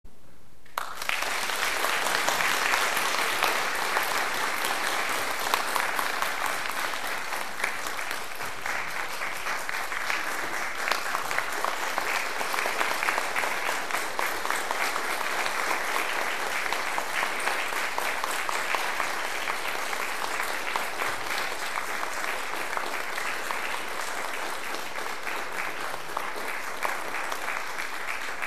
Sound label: Applause, Recital